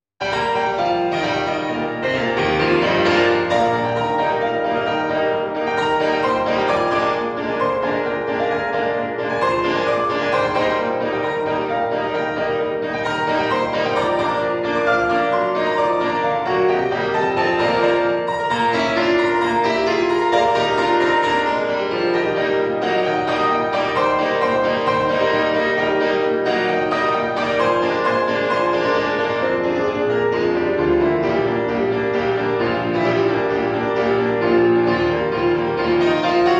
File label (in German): Marsch